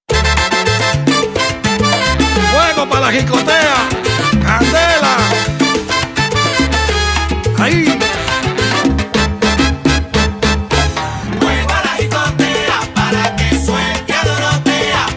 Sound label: Salsa, 214bpm